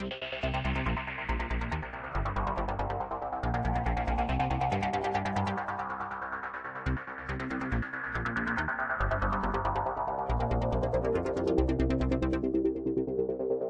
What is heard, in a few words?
140 trance induction; melodic loops; synth and electronic loops; Kirvoboica